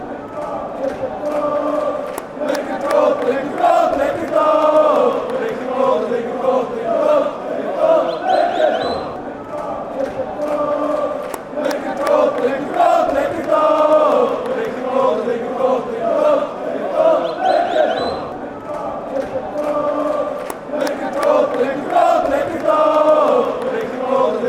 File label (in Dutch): lokeren zingen met klapt, fan chants